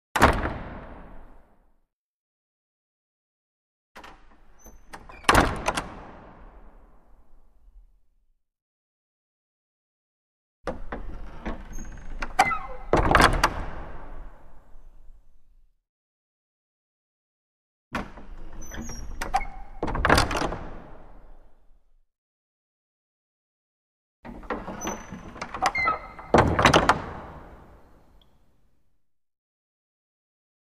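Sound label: Large Wooden Door Close, crash slams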